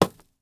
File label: Weather Snowball Hitting Car; AdobeCC